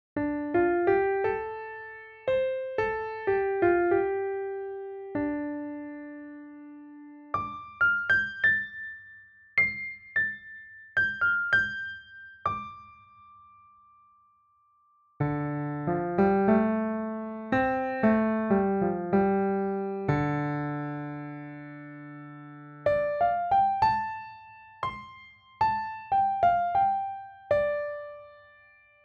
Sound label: Player trial - Closer Right-Rotated Cardioid Mics